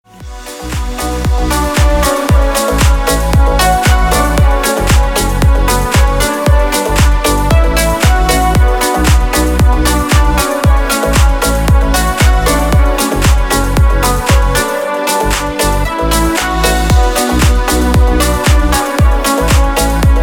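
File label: stylish melody for gadget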